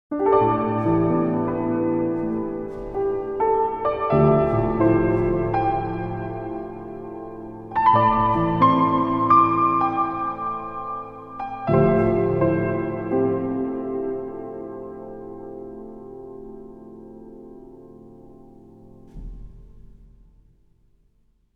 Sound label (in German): Klavier UAD EMT250